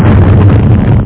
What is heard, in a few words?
miss explo